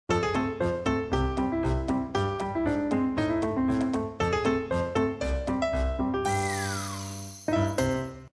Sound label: jingle - Fail